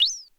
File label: Micro Goomba Defeated